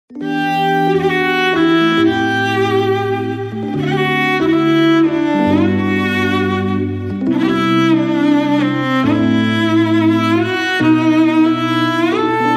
sad violin ringtone; android

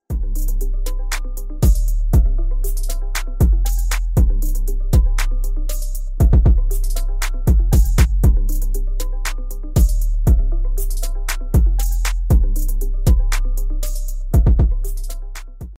google duo drip ringtone

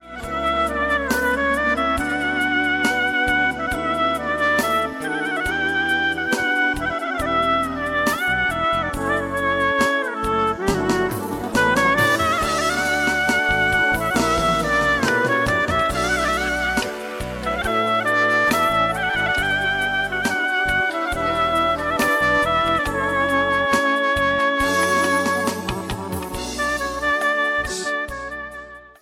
emotional clarinet ringtone; android

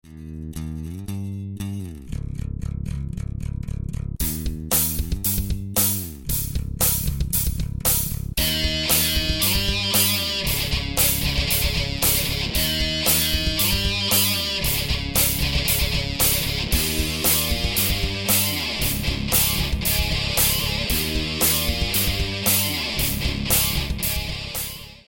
punk rock ringtone

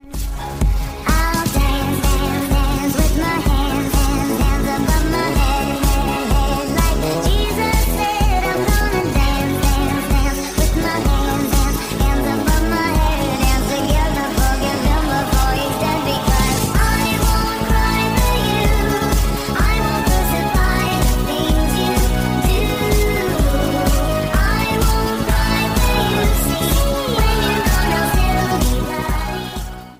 wednesday dance ringtone

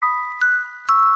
cute notification ringtone; android

